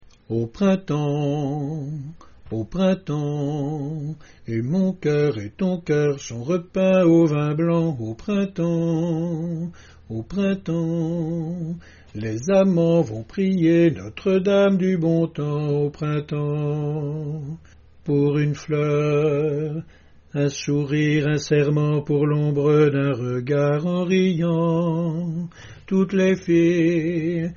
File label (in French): alto; au printemps